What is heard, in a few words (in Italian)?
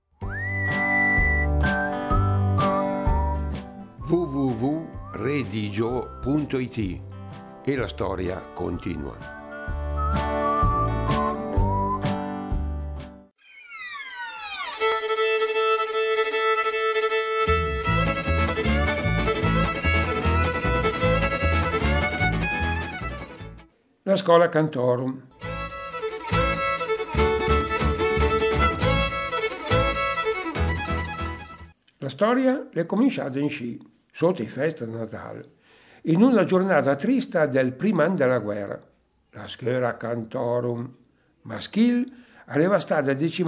dialetto schola cantorum